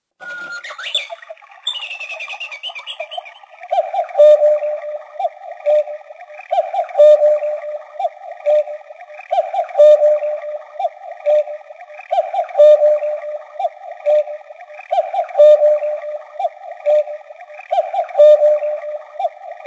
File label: 6 o'clock